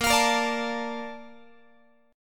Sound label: A sharp Major 7th, Guitar; strummed